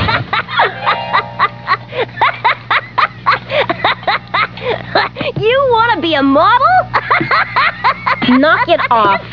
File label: laugh